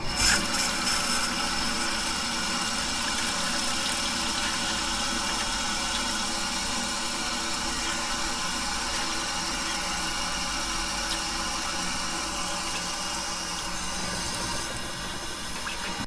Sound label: water put